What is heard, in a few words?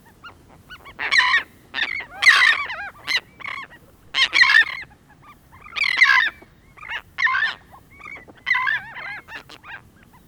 Bird Call Royal Tern Feeding Group